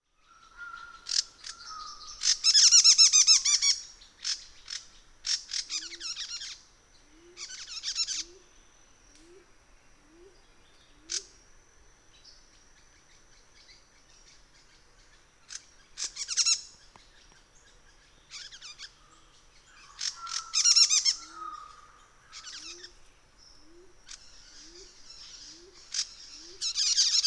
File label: Symposiachrus Trivirgatus; Spectacled Monarch; Call; Call 2